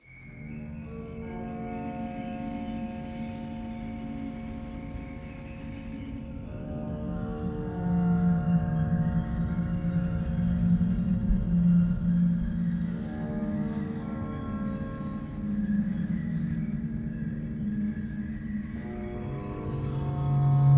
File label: forest